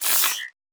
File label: anti clone field collide; The Swapper